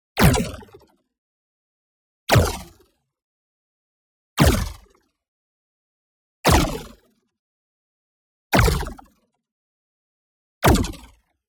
IMPACT SMALL Wave Packets; boom library; Matter

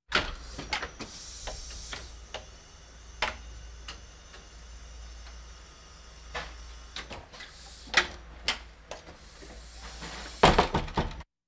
Hospital effects

